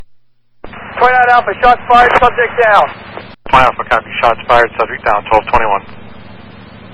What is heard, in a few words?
shots fired